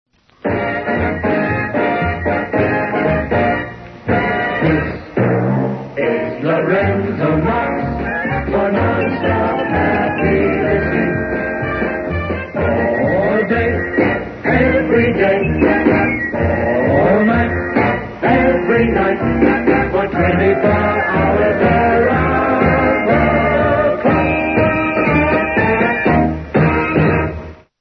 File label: 24hr jingle